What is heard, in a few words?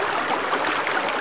stream